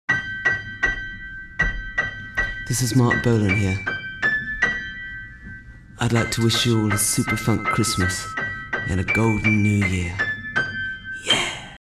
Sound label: Xmas Riff